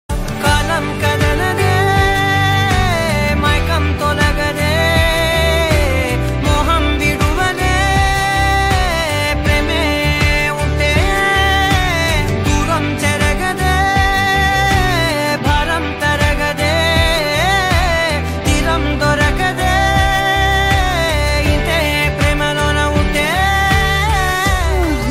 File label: love ringtone; villan beats